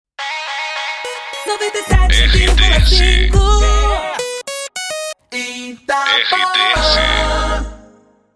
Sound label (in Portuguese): PASSAGEM; Itapoan